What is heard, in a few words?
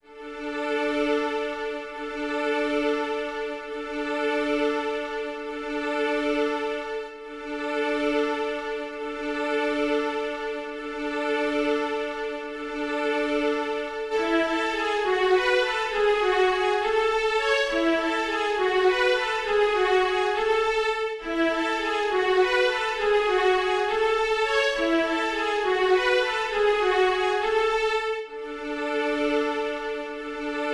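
Schumann Song of the Reaper ensemble Viola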